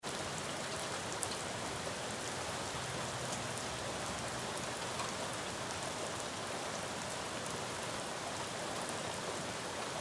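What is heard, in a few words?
Rain Street Neighborhood Medium; Splatty; Weather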